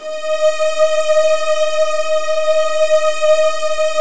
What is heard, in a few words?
D#2, Virtuoso Strings; ILIO; Kirk Hunter Virtuoso Strings VOL-1